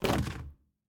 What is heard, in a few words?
Box Item Drop; Game rip; MiSide